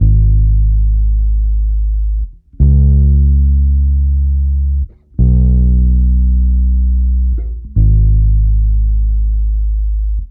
bass, Roots Funk; USB Soundscan vol.41; AKAI

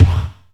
Strange Kicks, Percussion; Dance Synths

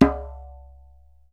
DJEMBE, African Perc

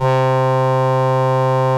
LAMBDA, String Machines

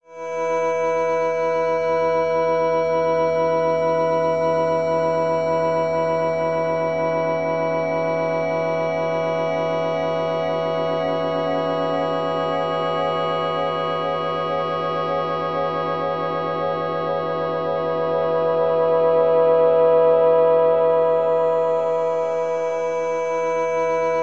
PENTATONIC; Bolder Sounds - Granular

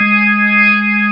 ORGAN B3 HAM G#; Best Service ProSamples vol.55 - Retro Sampler; AKAI

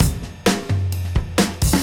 Beat Style A 130, breaks; 130bpm

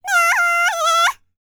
BLACK SEA, World Voices; ILIO; Vocal Planet VOL-5

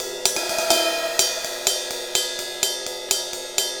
Ride Cymbal Pattern

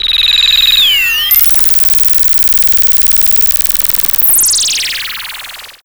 FX SWEEP, SYNTHSWEEPS

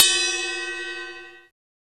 TICTALK DRUM

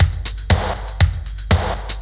Loop, Roland; Sound & Vision - Gigapack I

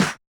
Yamaha DJX 2B, SD Analog

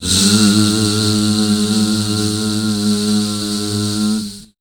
WEIRDOS, Voice Spectral; Best Service; Voice Spectral II

